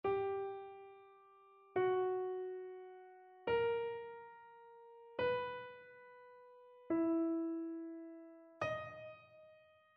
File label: interval chains